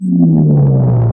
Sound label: repulse; forest brawl